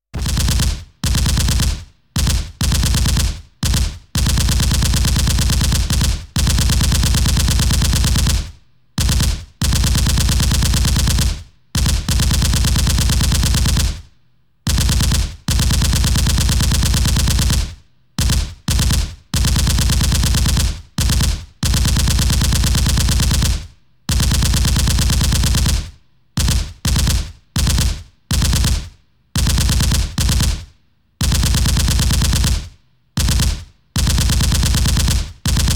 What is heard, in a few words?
Machine Gun Rapid Fire; KMP Film Video Sound Effects